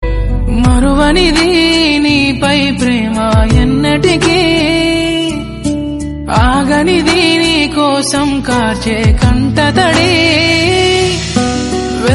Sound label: tamil, remix, song